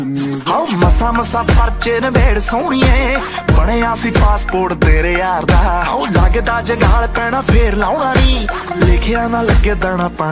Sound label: New Punjabi Song Ringtone